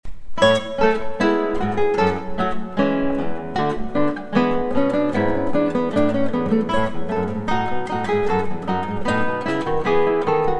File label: gavotte